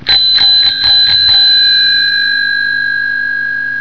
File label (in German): klingel